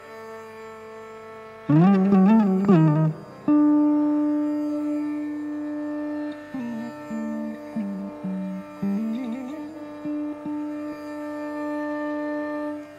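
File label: Hemavati topic phrase R, ālāpana; Srinivas’s topic phrases for R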